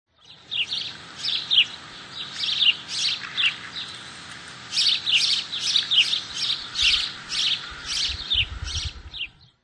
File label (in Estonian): koduvarblane säutsumine; winter; linnud